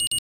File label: focused; Verykool; S757